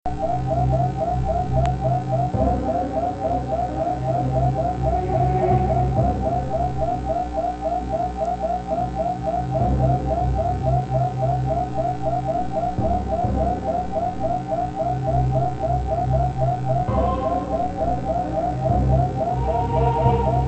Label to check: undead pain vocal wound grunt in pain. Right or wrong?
Wrong